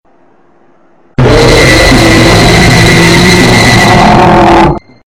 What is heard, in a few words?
Horror